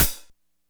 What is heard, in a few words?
Discoha, Closed Hats